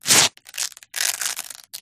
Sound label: bandage use